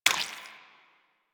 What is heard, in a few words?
Pirate Footstep Default; Warframe; Warframes